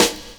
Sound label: SD, snare, 70 s Breakbeats